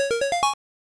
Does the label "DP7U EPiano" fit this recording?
No